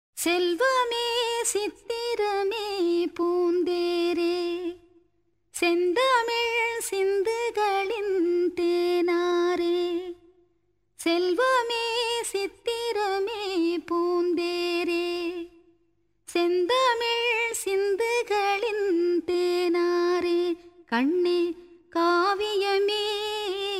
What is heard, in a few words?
Female; Pangali 1992